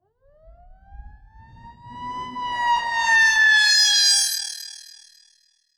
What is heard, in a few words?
Filter Proc B 110, alarm siren samples, 110bpm; musicradar